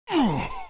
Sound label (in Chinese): 怪物死亡